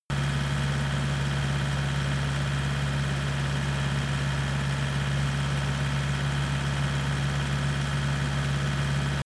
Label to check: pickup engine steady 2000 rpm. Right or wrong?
Right